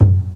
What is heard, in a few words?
1970s Folk Tom Sample F# Key, Royality free tom drum one shot tuned to the F# note; tom drum samples